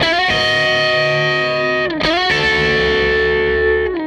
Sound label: Tele Guitar Loop